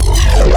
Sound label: custom bass